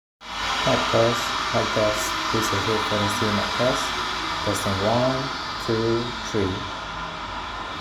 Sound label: Samsung Buds Live Noisy